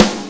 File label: soft hit clap